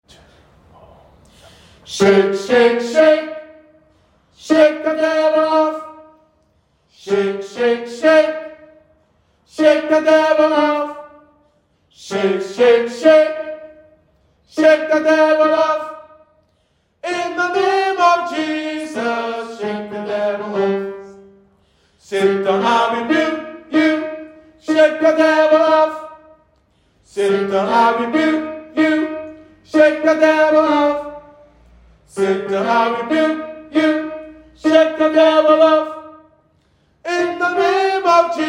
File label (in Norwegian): tenor, gospel; kongsberg; kor; gospel for alle